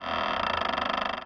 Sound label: Windmill Creak